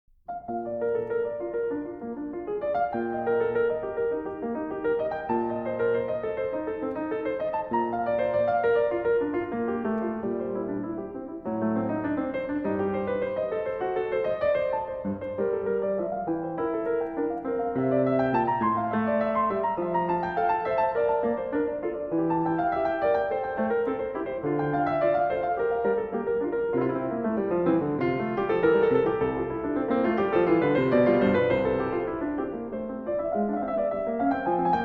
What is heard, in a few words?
partita no1 bwv825 allemande